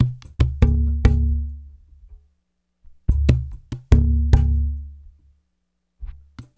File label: dry; Spectrasonics